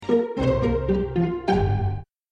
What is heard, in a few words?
jingle